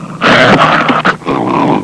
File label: dog growling; pets; Sound Effects for Multimedia - Volume 1